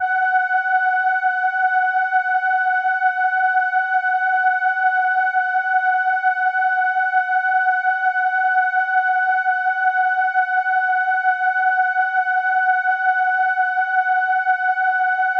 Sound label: Horn F#6, ARP Solina